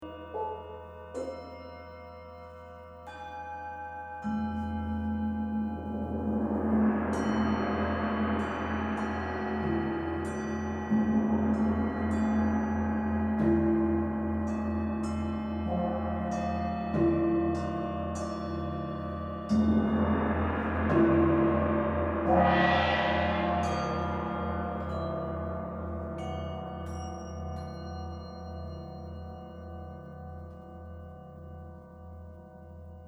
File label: bells, marimba